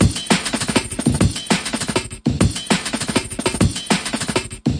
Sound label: DRUM LOOPS and BREAKS, 200 bpm; 181 bpm plus